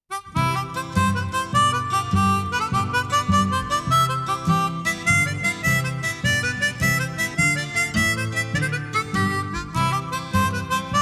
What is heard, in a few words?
shamrock jig